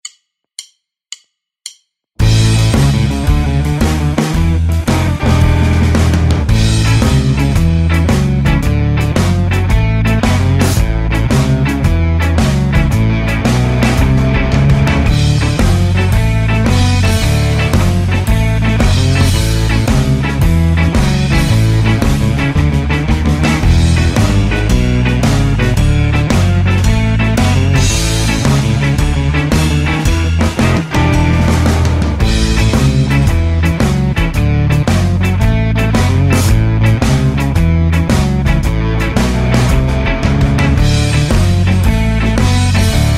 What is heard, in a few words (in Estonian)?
Blues rock ilma soolota